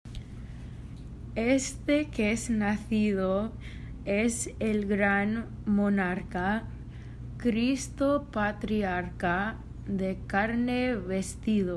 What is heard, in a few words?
RIU RIU CHIU SOLO Verse